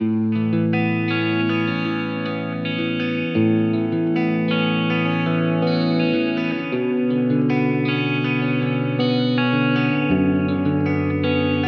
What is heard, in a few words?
harp